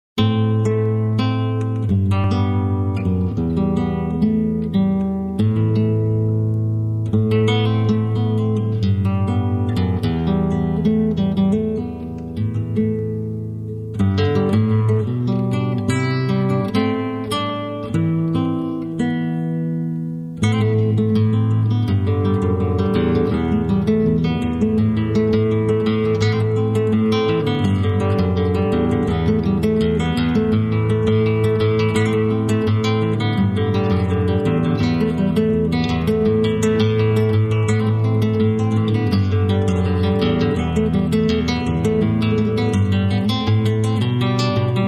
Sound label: guitar acoustic